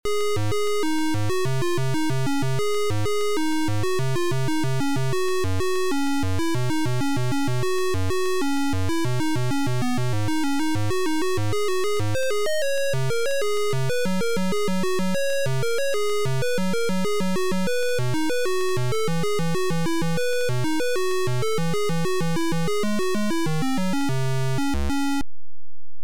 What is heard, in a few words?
Title Beeper; Martianoids